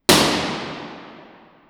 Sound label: stairwell